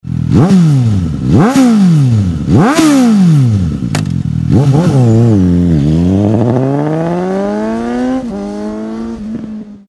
Suzuki GSX-S 750 ohne Soundeinsatz; REMUS

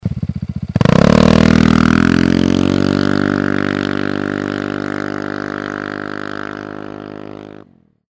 BMW C400X Slip On ohne Soundeinsatz; REMUS

